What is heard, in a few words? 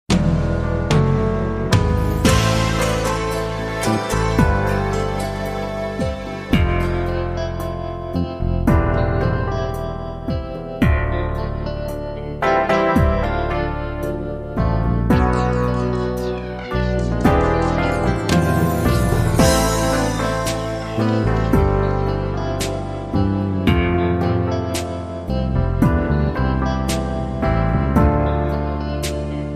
Power Ballad